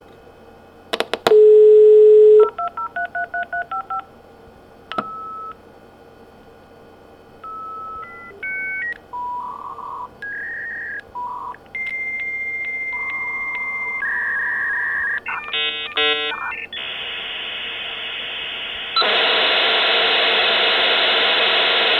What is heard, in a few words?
old computer modem connecting sending data